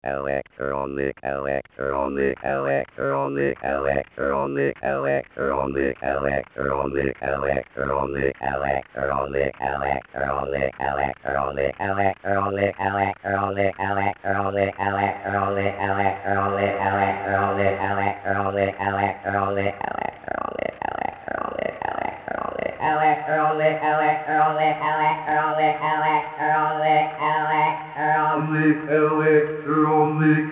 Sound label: chorus reverb